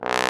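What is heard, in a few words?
BRS Bass Trombone, Solo; Roland LCDP06 Brass Sections; Bs.Trombones; Bs.Bone Solo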